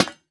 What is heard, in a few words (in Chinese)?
灯笼破坏声